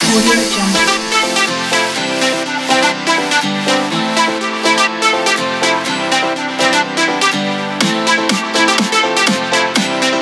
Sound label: dance pop; andreykornilin